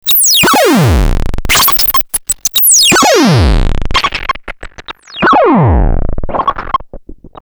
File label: quant filter; Another like the one above but with filtersweep; Soundfiles from the modular